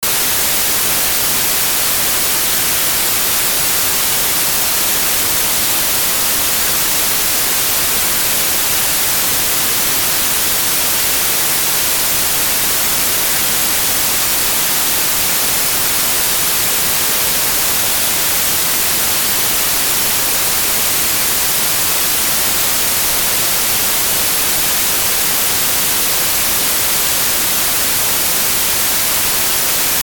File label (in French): bruit rose